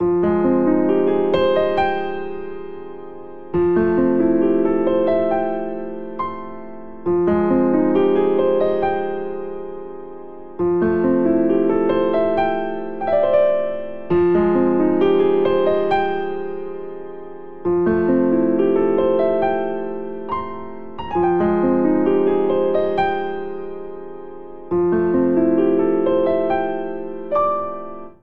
Vamp Arpeggiated Two Hands Sparse Trills F Minor F Major 68 bpm, Grand Piano; MIDI Clips; Ableton; Factory Packs